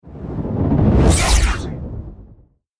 whoosh, laser zap; game tone

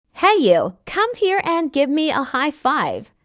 highfive; coldbeercafe

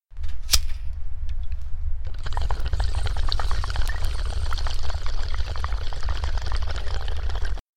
bong hit

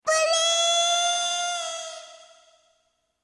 screaming with echo; purin

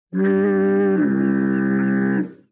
cartoon foghorn sound

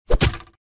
spear missing

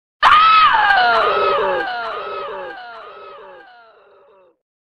scream

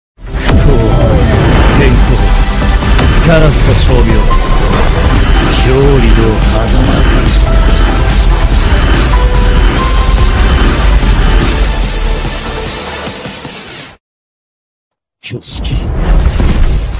hollow purple nuke

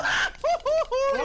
death

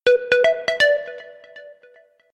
jingle; brussels; stib mivb

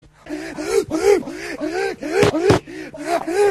inhale guy

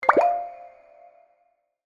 positive sound

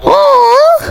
awwww; filian

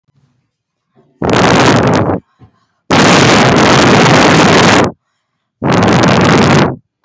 loud buton

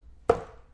darts sound

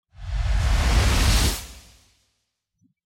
sparkle

